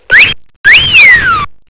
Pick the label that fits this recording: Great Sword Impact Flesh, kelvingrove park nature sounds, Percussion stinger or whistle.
whistle